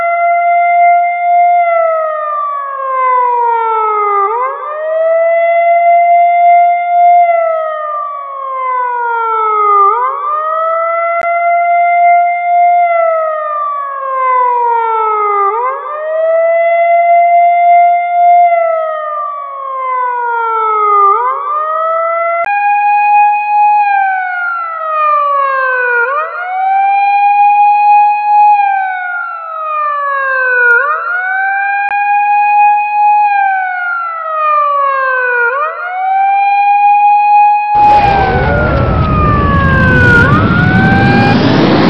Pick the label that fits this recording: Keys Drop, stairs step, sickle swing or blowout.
blowout